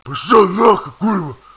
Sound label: zombie attack; zombie soldier